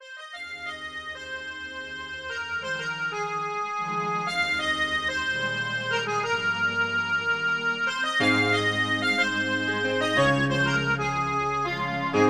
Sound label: High Key